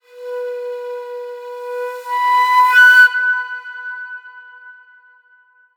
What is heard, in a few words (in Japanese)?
段々強く